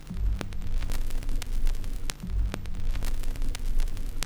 Crackle Loop, Vinyl Noise

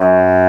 BASSOON C2; AKAI S6000 CD-ROM - Volume 1; BrassWind

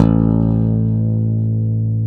WAL BASS

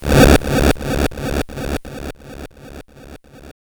Game Boy FX Explosion Loop, chiptune; Nintendo Game Boy

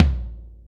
gretsch tom, deep house single hits

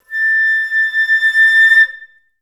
FL CRSC A5, Advanced Orchestra; Best Service

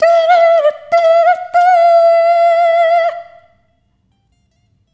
Rhythmic A

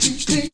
VOCALS; Ueberschall; Jam Box

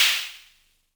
Snare, Univox SR-95

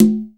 SI HI CONGA

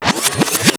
scratch Spn, Yamaha Motif ES; Yamaha Motif ES-Series

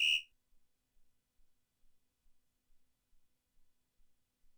Prcwhistle, ELKA X1000

